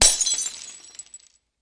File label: glass